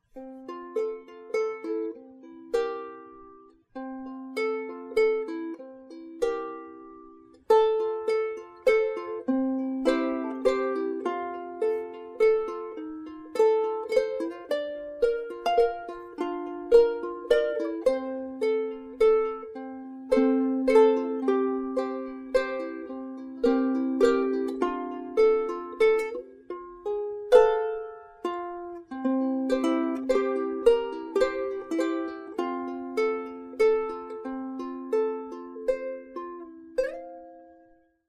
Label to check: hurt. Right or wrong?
Wrong